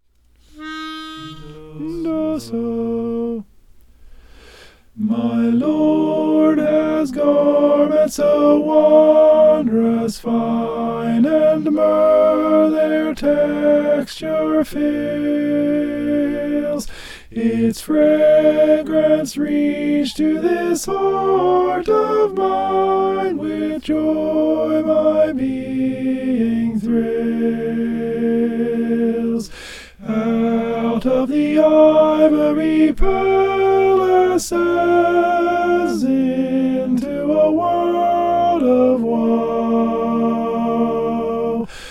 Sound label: alto